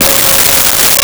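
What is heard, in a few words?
Jazz Guitar Db6; Nintendo; July 2020 Gigaleak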